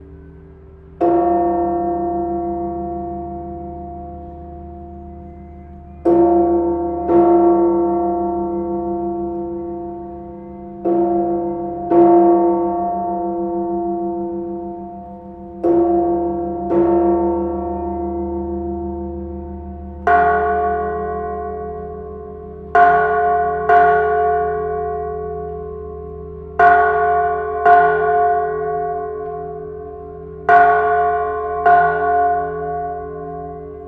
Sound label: bells at the metropolitan cathedral mexico city